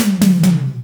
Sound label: Loops Fills; Vengeance Samples